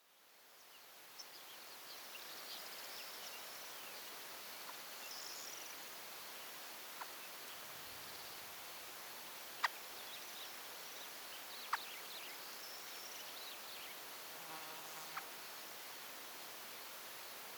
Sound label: montagu's harrier